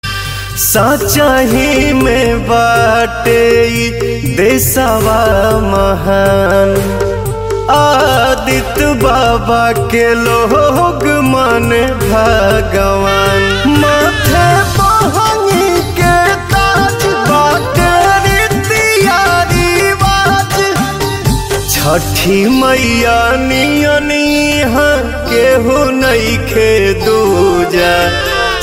Chhath Puja, Bhojpuri

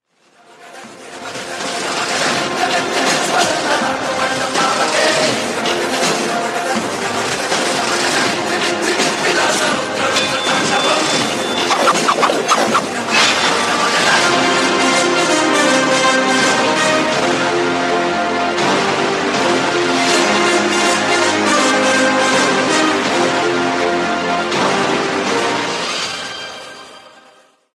Glimpse Bgm; Mirai Movie

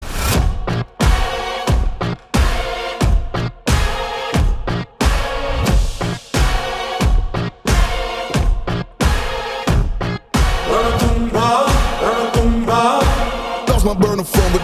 Song Bgm, telugu; telugu ringtones

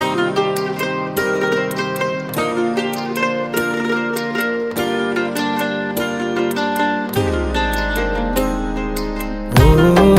Instrumental BGM Music Ringtone; bholenath

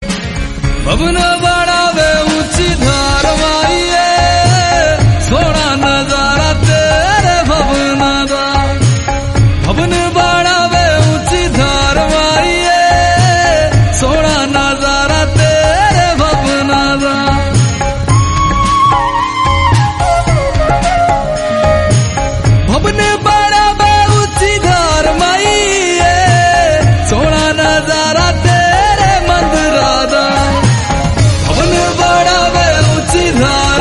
Himachali Bhakti Ringtone

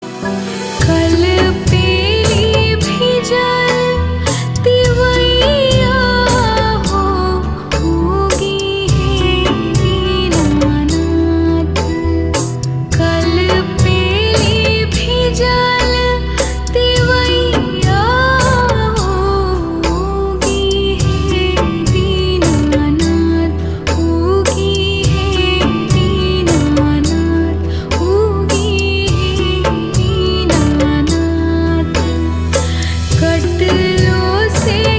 Chhath Geet; bhojpuri

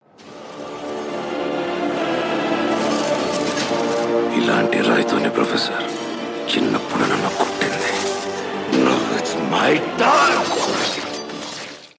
Teaser Bgm; Mirai

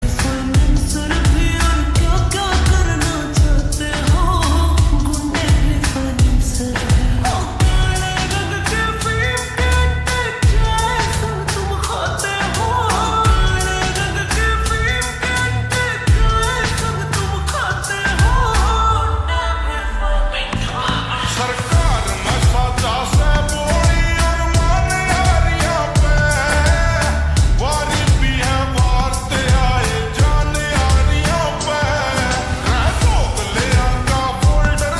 Lofi; telugu

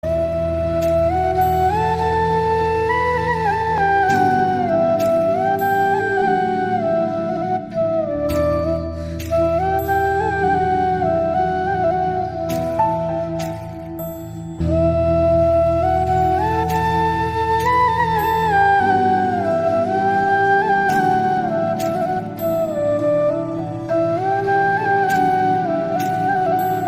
Chhath Puja Special Instrumental; bhojpuri

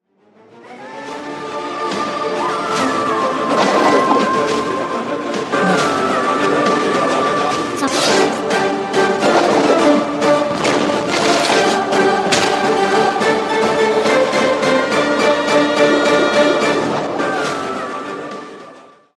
Teaser Bgm; Mirai

